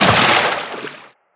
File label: Splash, Water